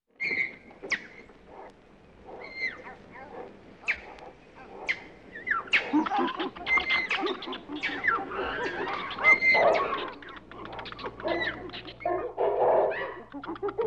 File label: Jungle animals ambience effect